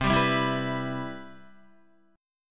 C Major 7th, Guitar; CM7 strummed; JGuitar

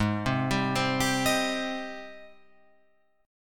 Ab Major 7th Ab down Guitar Open F; AbM7 arpeggiated

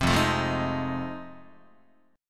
F 7th Sharp 9th Guitar, chord; F7#9 strummed

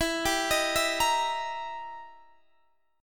E Minor Major 7th Flat 5th E down Guitar Standard; arpeggiated; capo 2

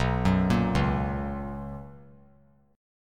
B Major 7th Suspended 2nd, chord; BM7sus2; arpeggiated; JGuitar

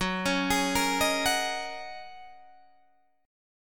F sharp 6th Add 9th Guitar Open D, chord

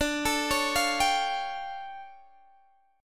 D Minor Major 11th, down, Guitar, Open D

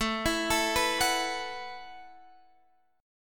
E Suspended 2nd Suspended 4th A

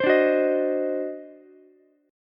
D 7th Flat 9th, Ukulele C6; 17,14,14,18; strummed; JGuitar

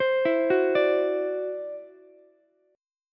E 7th Suspended 2nd Sharp 5th, E, down, Ukulele; E7sus2#5; arpeggiated

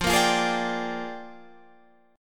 Bb 13th F

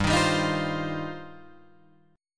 G 7th Suspended 4th Sharp 5th, Guitar Open F; Listen to G7sus4#5 strummed; G7sus4#5 Chord

